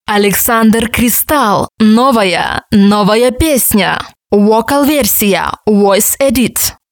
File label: Jingle